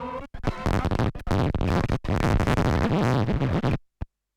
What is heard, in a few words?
Turntablism; Sonokinetic - The Roots Drumloops